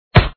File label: hit; pencak silat 2 2002 gamerip online